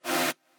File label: chord